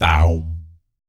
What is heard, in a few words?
BS, Vocal BASS FX